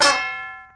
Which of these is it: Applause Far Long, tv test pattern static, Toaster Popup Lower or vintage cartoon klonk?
vintage cartoon klonk